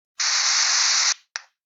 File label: hypo; TOS